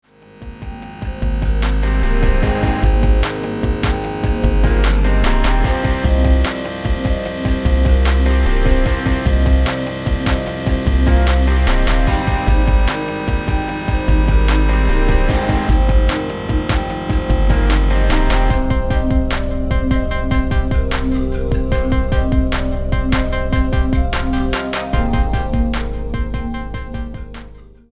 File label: 80s snippet